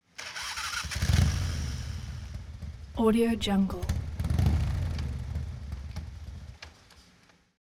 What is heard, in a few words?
transportation motorcycle harley ignition start engine